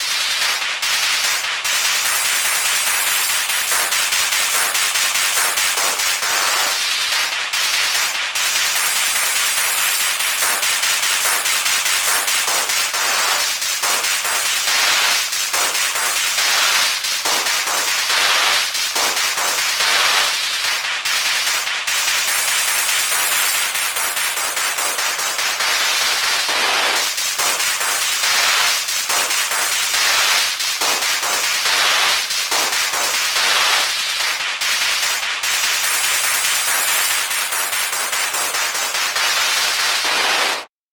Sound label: applause